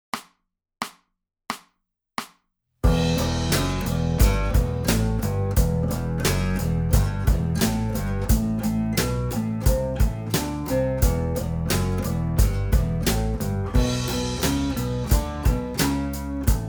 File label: Rock; Pauls Rock; die bunte gitarrenschule band 2